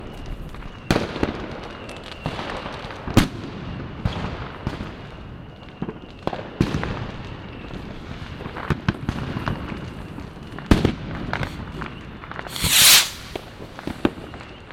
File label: Fireworks